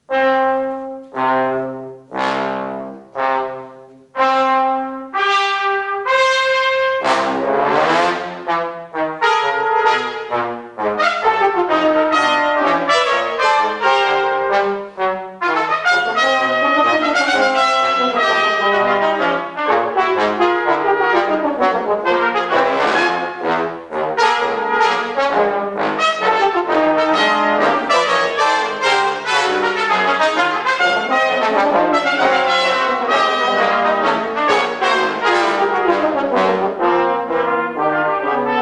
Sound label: Walking, Brass